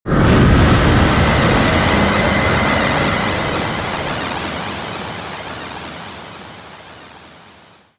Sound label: magic cloud